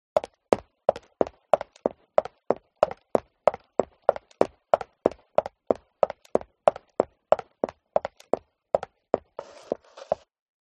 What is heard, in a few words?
FOLEY STEP High Heels Concrete Run Sequence; smartsound